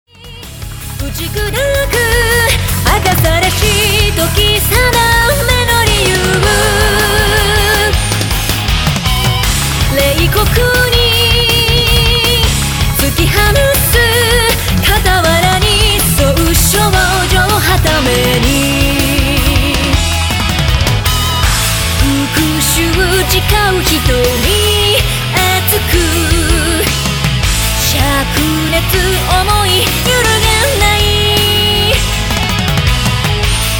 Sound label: Pop Rock